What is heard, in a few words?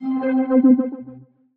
SYNTH Wheel (C); Melodic One Shots; Cymatics; ANALOG EVOLUTION Beta